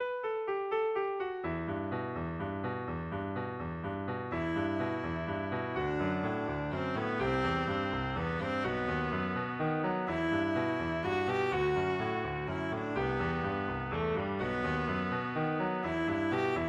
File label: spanish violin, viola